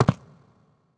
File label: step; GoldSrc